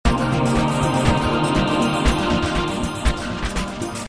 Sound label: 120 Cm7; Triumph